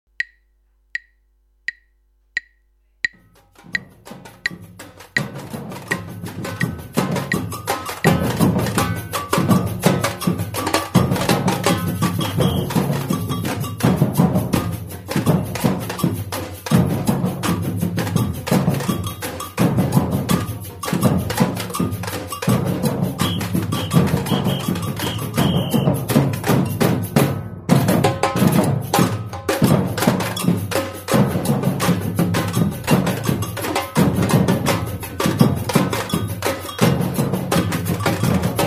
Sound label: Samba reggae Break